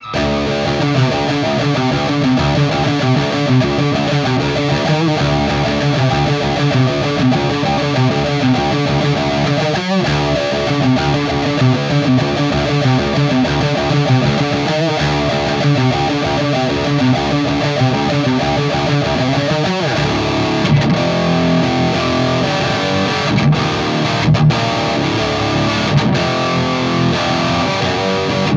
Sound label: Riff